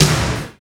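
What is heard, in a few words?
SNARE, left; East West - Phat & Phunky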